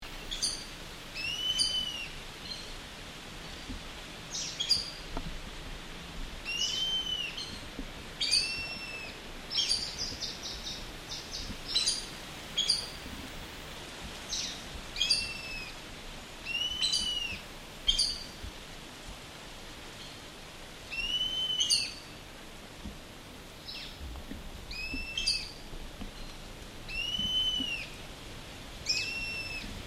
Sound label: collared aracari toucan, Belize birds birdsongs